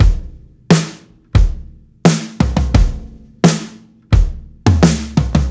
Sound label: NO HH, breaks, drums; Basement drums vol 2